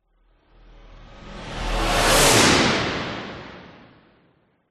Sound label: science fiction car vehicle drive zoom past engine rev